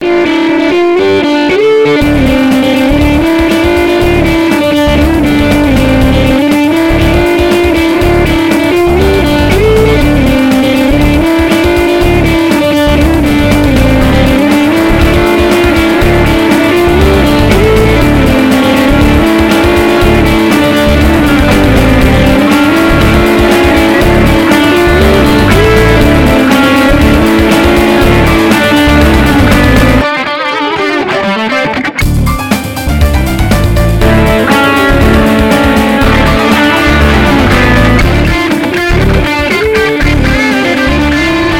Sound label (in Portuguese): estrumental riff